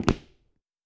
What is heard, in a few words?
Dress shoe